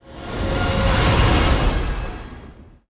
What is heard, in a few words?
spell chase cast; archer